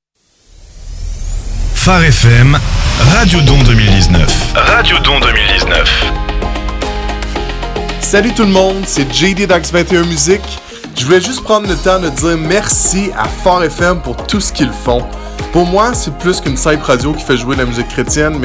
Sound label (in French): musique